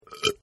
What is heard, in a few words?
Burp; Burping; Sound Effects:Human; Partners In Rhyme